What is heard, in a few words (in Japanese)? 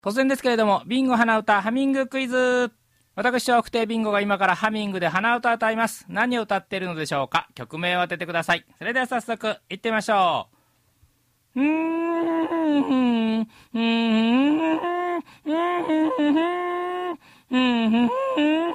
hanauta